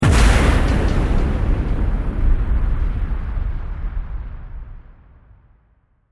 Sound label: atk boom